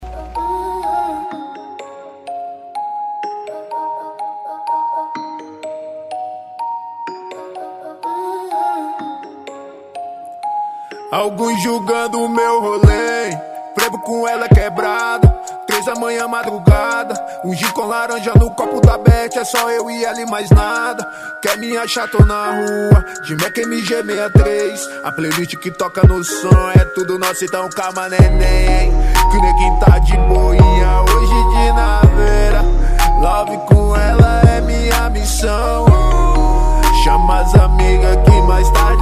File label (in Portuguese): funk conciente e hip hop nacional